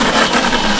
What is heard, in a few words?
slide metal, terrains; Tony Hawk's Project 8; XBOX